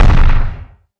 Small metal hit explosion; Hovl Studio; SFX Battle sounds; WhiteMan Unity2D

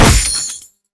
Bullet hit glass; Hovl Studio; SFX Battle sounds; WhiteMan Unity2D

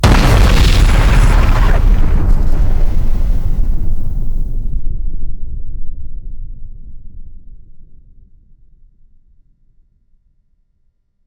Nuclear explosion; Hovl Studio; SFX Battle sounds; WhiteMan Unity2D

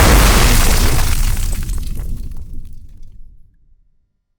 Explosion hit wood; Hovl Studio; SFX Battle sounds; WhiteMan Unity2D

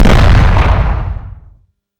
Earthquake Hit; SFX; WhiteMan Unity2D

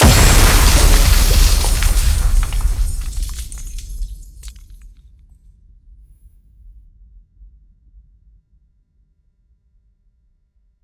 Explosion hit glass; Hovl Studio; SFX Battle sounds; WhiteMan Unity2D

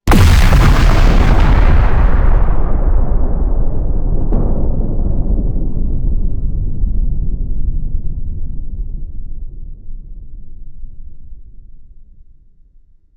Big nuclear explosion; Hovl Studio; SFX Battle sounds; WhiteMan Unity2D

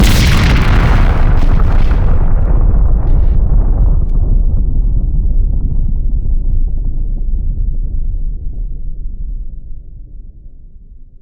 Big nuclear explosion; Hovl Studio; SFX Battle sounds; WhiteMan Unity2D

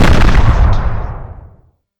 Medium explosion; Hovl Studio; SFX Battle sounds; WhiteMan Unity2D

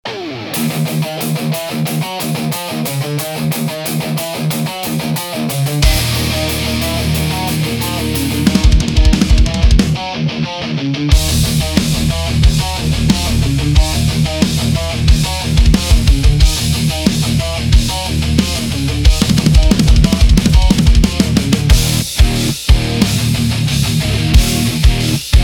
Boss BGM; WhiteMan Unity2D

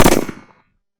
Muzzle flash multi; Hovl Studio; SFX Battle sounds; WhiteMan Unity2D

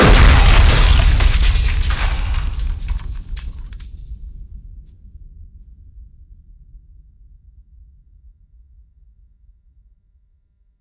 Explosion hit glass; Hovl Studio; SFX Battle sounds; WhiteMan Unity2D

